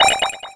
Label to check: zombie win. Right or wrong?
Wrong